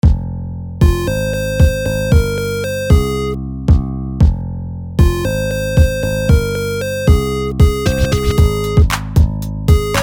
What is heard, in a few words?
hip hop mix; AVGN